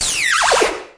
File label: synth drop